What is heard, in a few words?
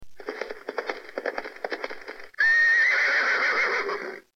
whinny